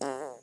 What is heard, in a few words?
fart